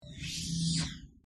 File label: hologram off